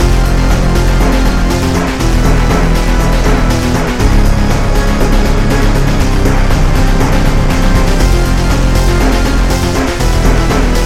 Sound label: earthmover loop